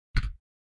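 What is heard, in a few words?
keypress spacebar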